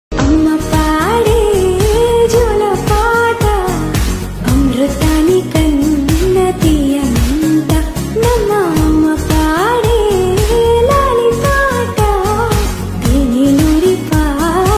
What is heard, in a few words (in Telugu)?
song love telugu music